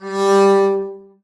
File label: arco g3 ff down, double bass; dsmolken